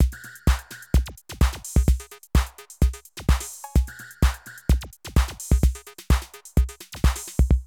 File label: Drum loops, Euro techno